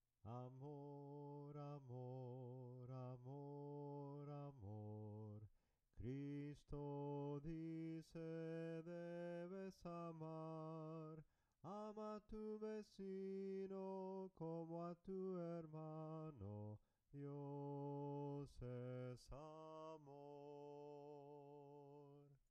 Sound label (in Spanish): SOPRANO CANTADO, himnos en melodia soprano